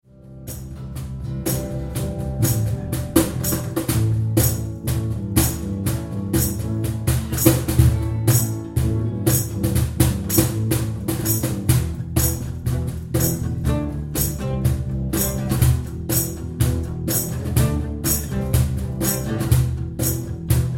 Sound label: Cajon Rocking Acoustic